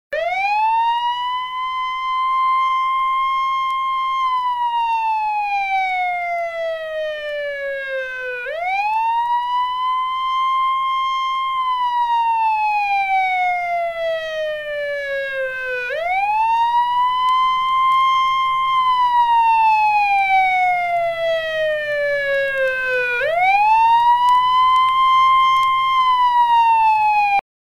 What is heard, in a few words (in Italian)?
Suono sirena allarme e macchina del tempo